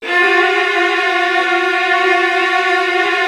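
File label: Virtuoso Strings, violin trills, F1; ILIO; Kirk Hunter Virtuoso Strings VOL-1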